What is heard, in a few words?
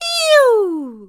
Yeeooo; Oren giving Link a gift; zelda